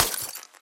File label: window break